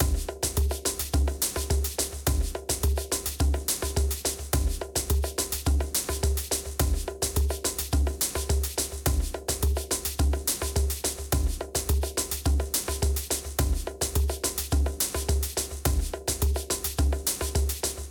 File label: Tension Drum Curve